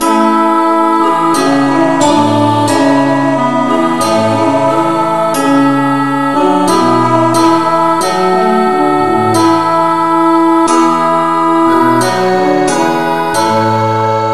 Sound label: no vocal, key a; christe Sanctorum